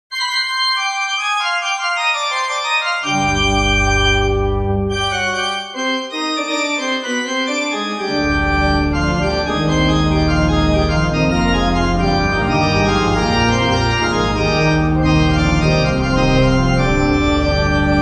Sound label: Spooky Organ; Growtopia; android ios mobile windows gamerip 2012